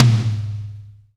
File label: DRY TOM 2 L; Spectrasonics; Burning Grooves; BG TOMS